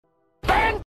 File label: ban sound effect; Memes